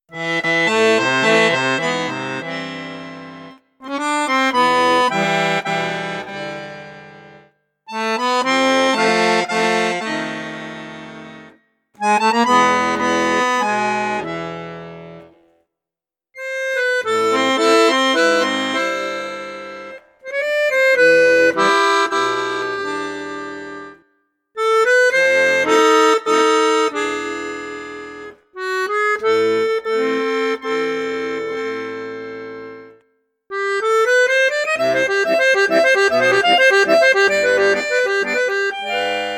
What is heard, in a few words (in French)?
registre basson octave flute juste, accordéon; accordiola